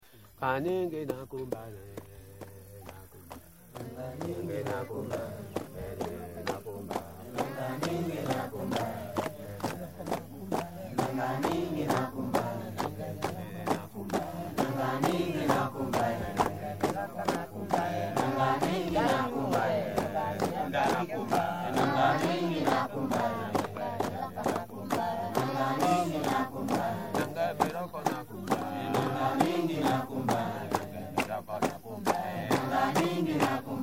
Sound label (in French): aka choeur, afrique centre